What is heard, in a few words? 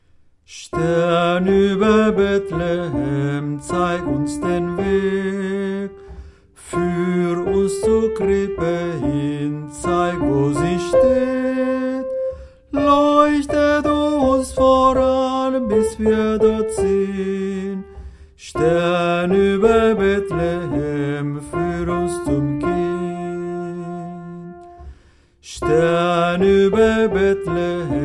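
Soprano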